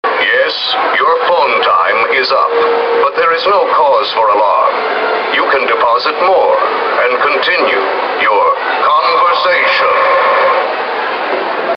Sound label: phonebooth